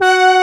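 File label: brass G4, synthesizer, analog brass; AKAI S6000 CD-ROM - Volume 1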